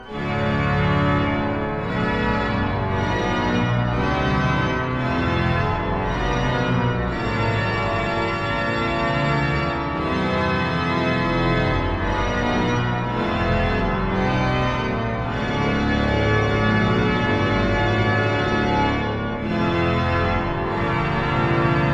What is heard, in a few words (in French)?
Toccata, fugue et hymne sur 'ave Maris Stella' - Hymne, Organ Music; Flor Peeters; Op. 28; III. Hymne